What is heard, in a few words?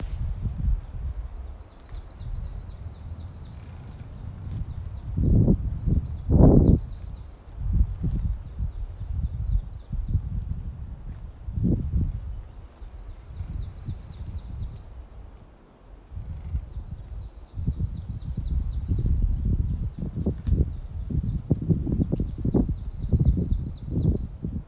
Birdsong